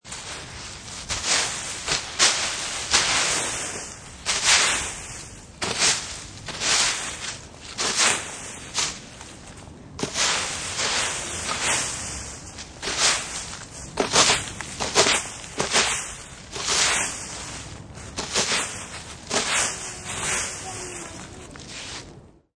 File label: Rkng Lvs, Raking leaves in yard.; Yard Tools